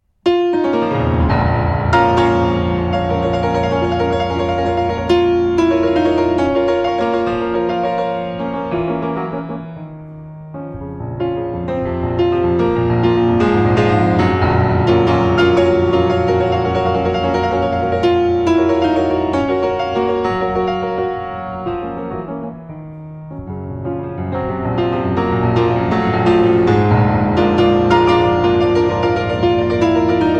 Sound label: Piano Sonata No. 2 in B-Flat Minor, Allegro Agitato